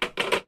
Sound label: handset handling